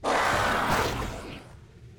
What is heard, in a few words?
opening yell; heirophant; HLD